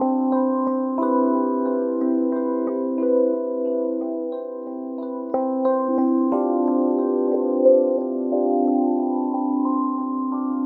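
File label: Emotional, ambient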